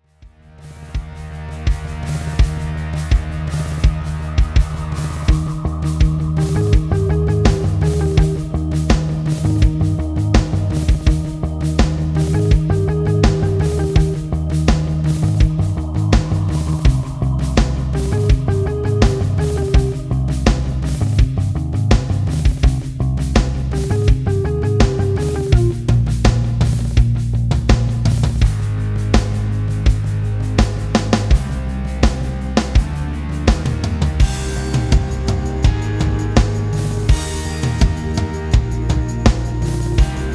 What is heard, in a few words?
rhythm